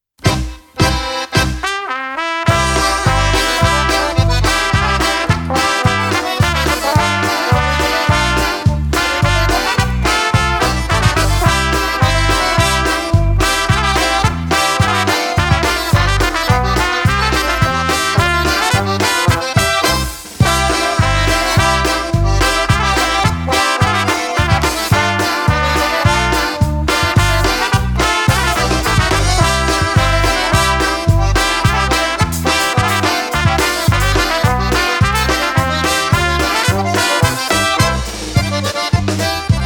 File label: Krakowiak